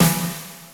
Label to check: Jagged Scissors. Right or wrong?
Wrong